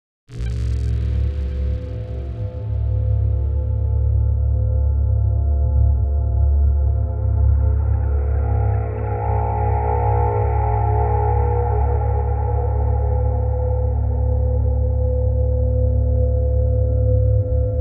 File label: scary ambience drone; maelsh